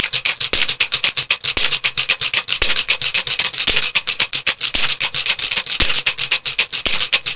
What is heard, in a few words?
tambour; Casio Colordesk Slides and Sound 1997